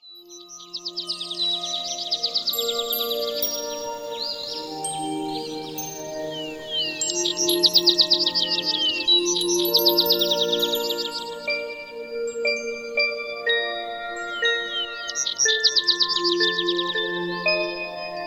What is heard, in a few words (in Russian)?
nach skazki, muzyka dlia nachala skazki